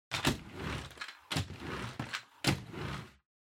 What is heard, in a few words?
Vacuum Cleaner Head Move